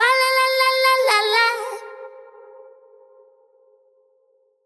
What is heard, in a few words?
vocal syllable phrase lalalala stacked wet, 122, Eb maj